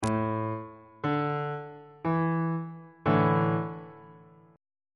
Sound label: d suspended 2nd 2nd inversion on bass clef